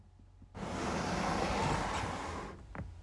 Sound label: Toolbag slide